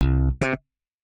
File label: Slap Bass, notifications; Samsung; Galaxy A56; Fun